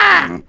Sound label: MONKEY BOXER hit